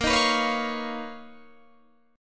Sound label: B Major Sharp 11th Bb